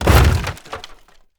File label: wood break; teardown ps5 windows xbox series xs gamerip 2022